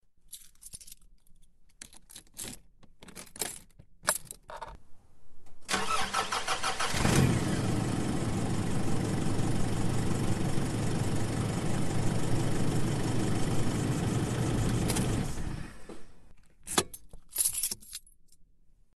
car start up sound effect put key into ignition start engine